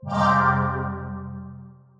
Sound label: Phase Notification